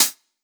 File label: Closed Hat, 909; Slate; Vintage Drum Machines Volume 3 909 Edition; 909 Closed Hats